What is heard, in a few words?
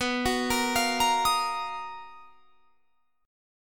B Major Sharp 11th